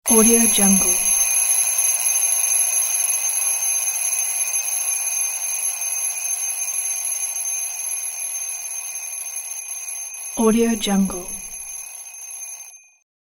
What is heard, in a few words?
alarm sound